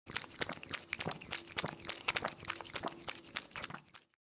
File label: cat drinking water; آب خوردن گربه